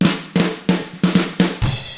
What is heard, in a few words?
HARD ROCK, Drums